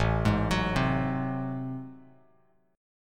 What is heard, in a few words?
A Minor Major 13th A, Bass Standard; arpeggiated; AmM13; JGuitar